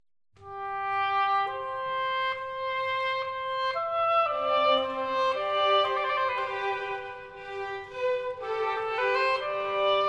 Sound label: Oboe Concerto In F - Grave